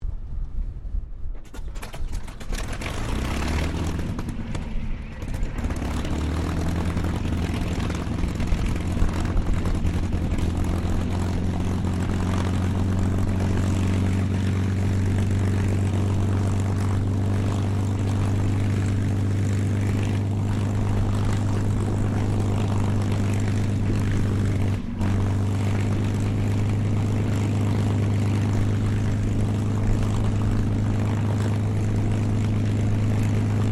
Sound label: AIRCRAFT CAC CA-18 MUSTANG MK23 STARTUP IDLE TAXI WINDY; CA-18 /P-51 Mustang; Airplanes; Vehicles; Aircraft Collection; Twistedtracks